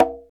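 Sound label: Conga Hi, Roland R-8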